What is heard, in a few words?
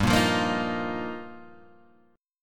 G Minor 7th Sharp 5th, Guitar, Standard; Gm7#5; strummed; JGuitar; 3,1,3,3,4,1